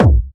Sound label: raw kick drum c key